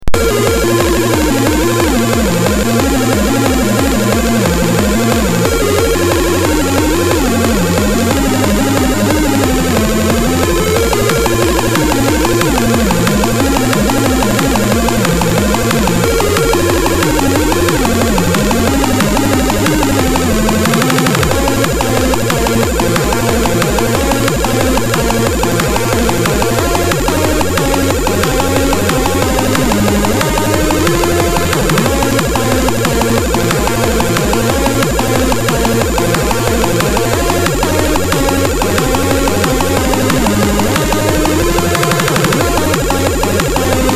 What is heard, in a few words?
zx beep